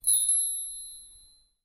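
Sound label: sound of a beam of light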